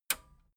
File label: metal button radio release disable